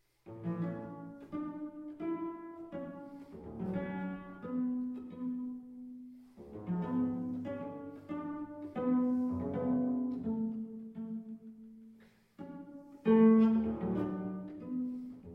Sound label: Cello Acrostic